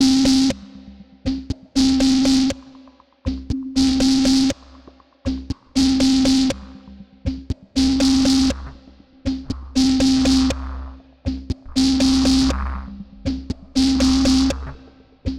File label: Electro Beat; Get Even